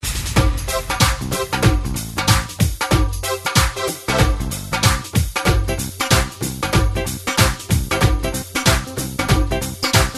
Dhol Mix

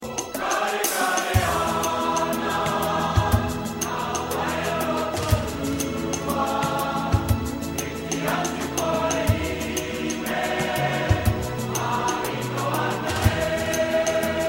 Maori Song

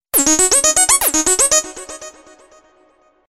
Message arpeggio

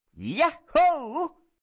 Ya Hoo

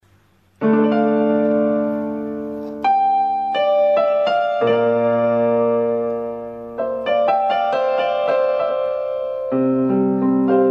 Piano Improvisation

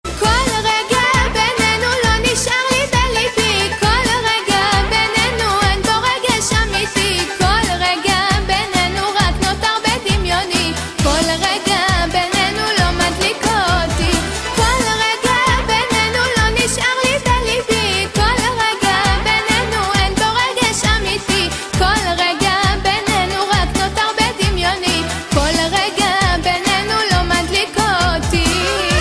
Hebrew

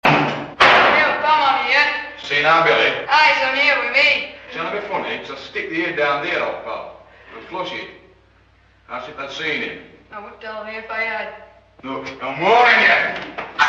toilet

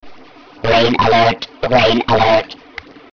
Rain Alert

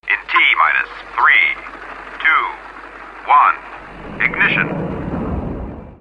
Spaceship Blast-off

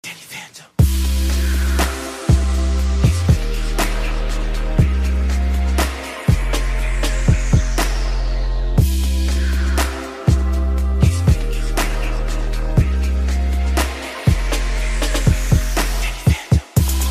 Trap; Danny Phantom

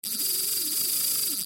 Fishing Reel Drag

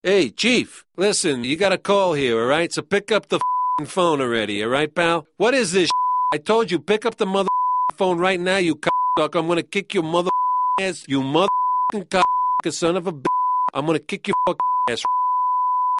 Bleep

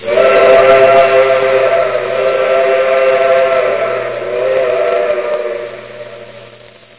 Train Whistle

